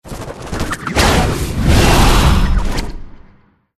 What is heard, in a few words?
attack power card fire